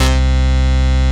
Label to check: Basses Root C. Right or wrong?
Right